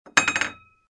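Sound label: sounds of table plates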